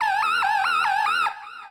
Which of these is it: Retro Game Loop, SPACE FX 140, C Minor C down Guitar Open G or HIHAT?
SPACE FX 140